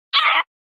squawk parrot

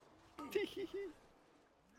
teehee

